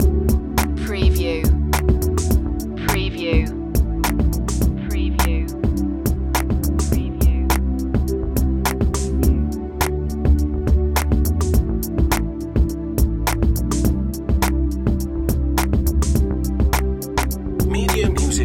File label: downtown groove loop